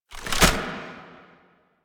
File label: Rocket Launcher Reload; Warframe; Tenno; Lasrian